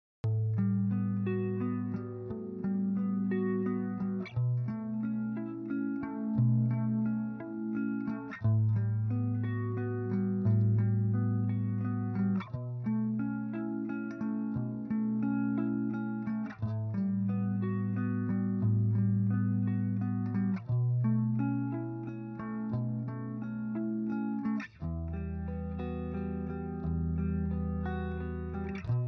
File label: Lullaby